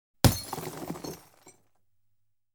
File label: TV Screen, Smashed By Cricket Bal; BBC Sound Effects Library